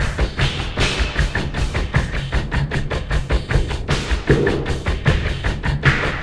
Lake beat

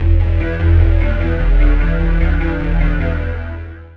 Forest Synth Bass; torchlight iii; ps4

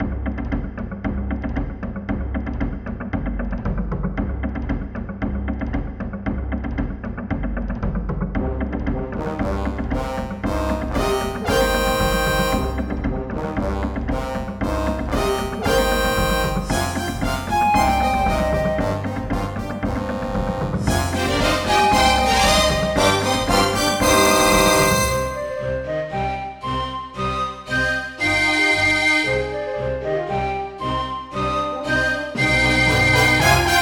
Jungle

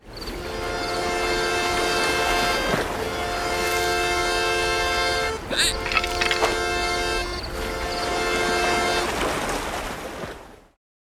splash audio; Sea of Lies Leviathan Reef Collector's Edition

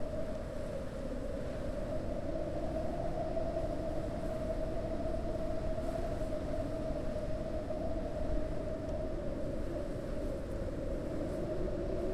Ambience wind; Tricky Towers; PS4

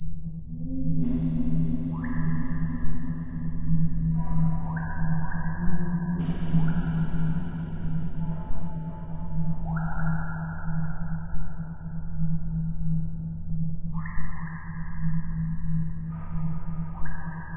Hum Sewer; mr future ninja

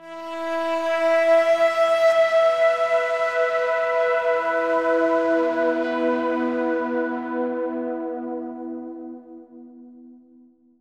Synth E Long

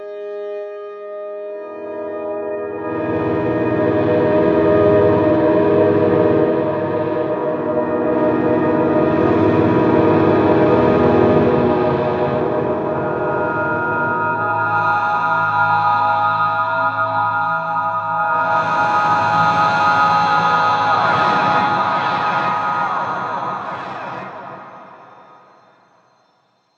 Forest Steel

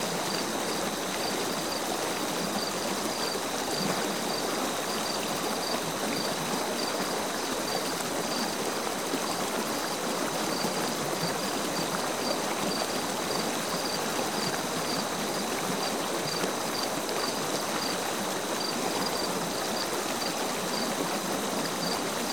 GARDEN AMBIENT

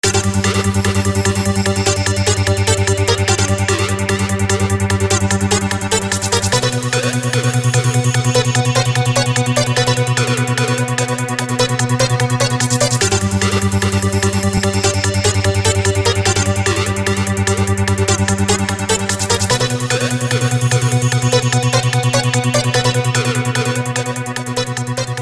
Thinking Time!; paper mario the thousand year door; GameCube

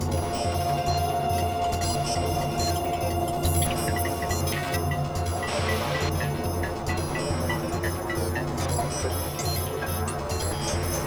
Glitch sonata Slow; rive wreck hack die retry; ps4

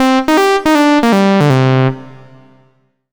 MUSIC EFFECT Solo Clean Sawtooth Negative; startide macos switch windows gamerip 2017

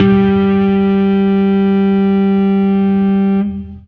Fuzz G harm

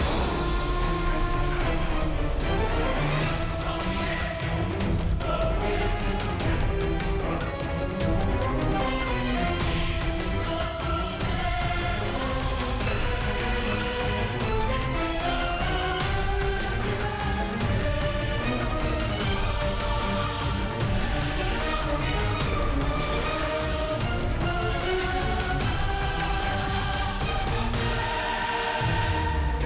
Battle Boss; Kargalgan Phase 02

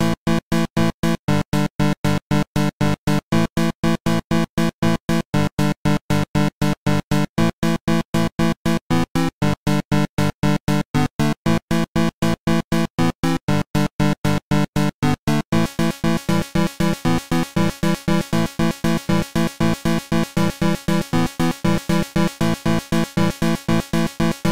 End of Quarter; NBA Jam 99; GB

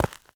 Ground Thud; Blastmorph Pinball; xbox series xs

